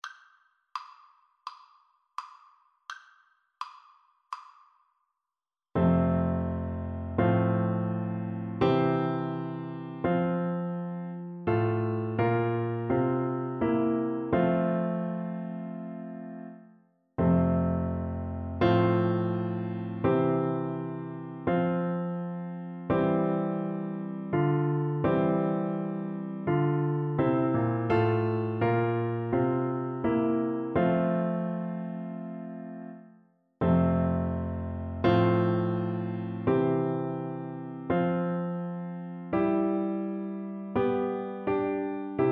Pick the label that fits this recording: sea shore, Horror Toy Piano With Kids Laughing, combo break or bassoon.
bassoon